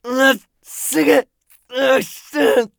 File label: agony; Escape from Tarkov